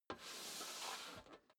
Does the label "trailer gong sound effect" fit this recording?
No